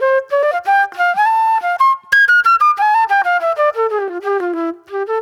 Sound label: J-Funk Flute, Call And Response